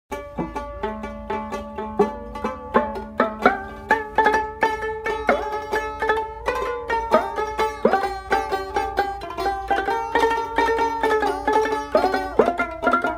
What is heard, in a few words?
Dotara and Khamak